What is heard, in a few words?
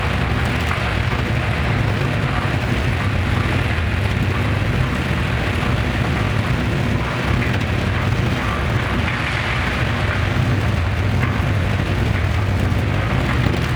Engine Broken